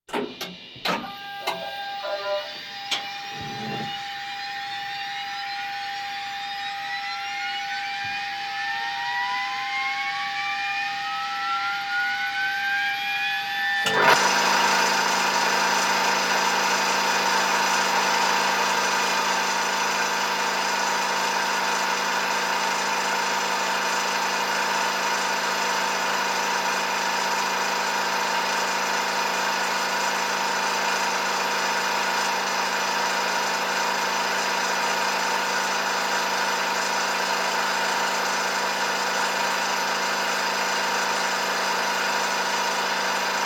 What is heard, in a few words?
Military vehicles